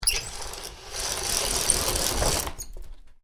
Roller blind up, Roller Blind: Domestic, Roll Up With Cord; Miscellaneous Household; Miscellaneous Household Sound Effects; Miscellaneous Household (Sound Effects:Household); Stuart Duffield